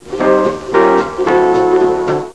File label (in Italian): musica strumentale